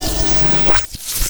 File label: Cast End